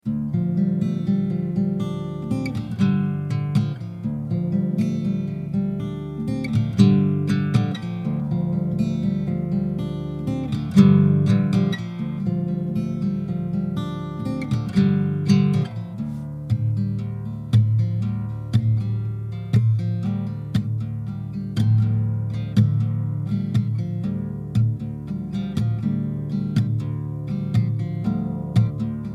D120 GTR Riff

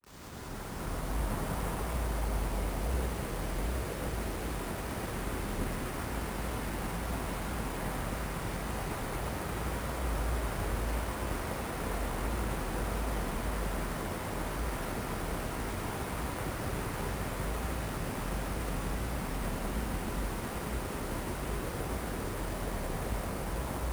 mountain stream stems; airtone

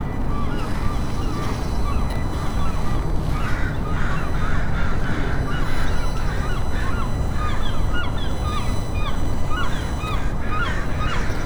seagulls and crows; Coruscate

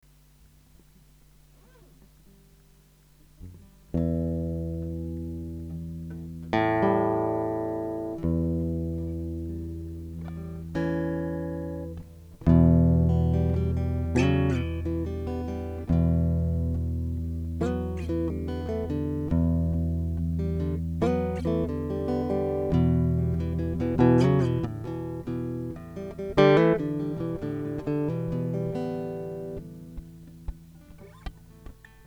Em 140 renaissance guitar impro

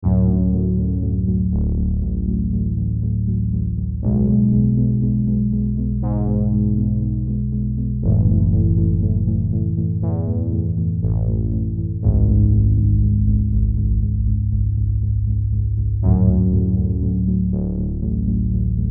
Dream SEQ

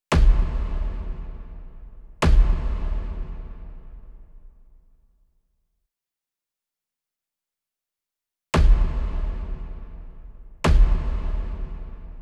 Monster Kick

